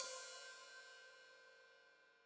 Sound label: Hat Open, Hi-Hat; Deeply Sampled Drums